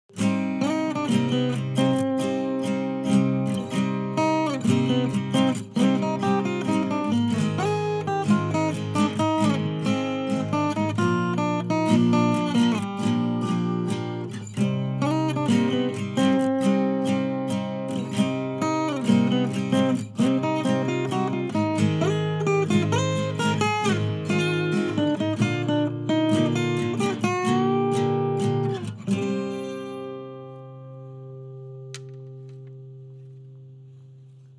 Acoustic; MMX Intro Stage

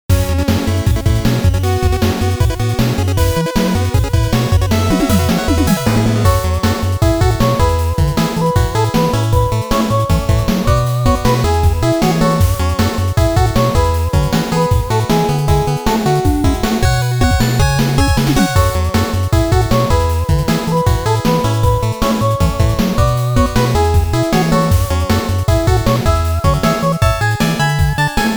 8bit Arrange; Summon Night Granthese

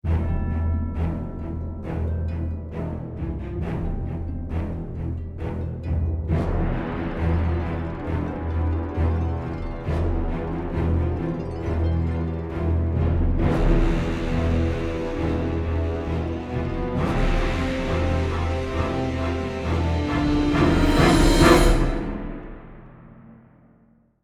Stinger Fuse Timer; Pegasus

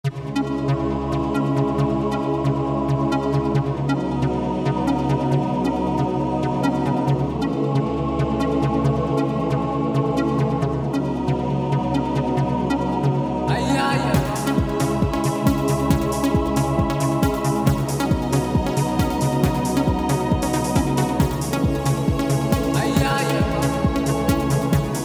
Elevator Music; Silent Thunder

